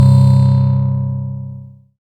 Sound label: SYN BROKEN, SYN MicroWave; Sound & Vision - Gigapack I CD 2